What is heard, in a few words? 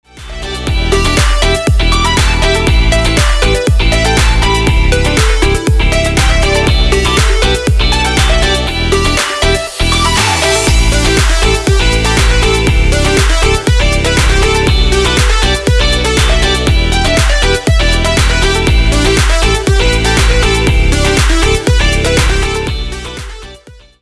Upbeat Summer